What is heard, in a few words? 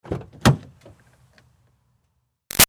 Car Highlander Door Open; 2005 Toyota Highlander V6; Cars; Vehicles; BigRoomSound